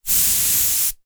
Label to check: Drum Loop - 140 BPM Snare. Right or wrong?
Wrong